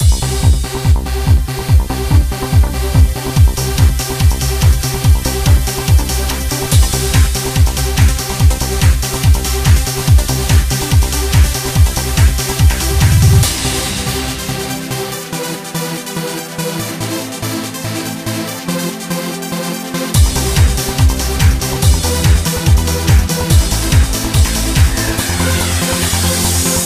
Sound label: music (loop); StepMania 5; Dance Dance Revolution 6th mix -DDR MAX